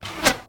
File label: medieval scythian recurve arrow heavy pass by; still north media